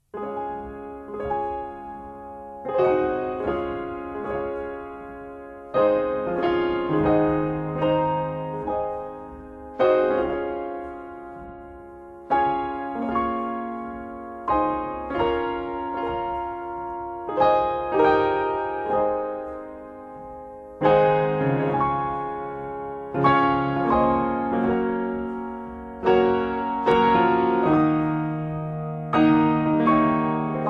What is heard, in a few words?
piano solos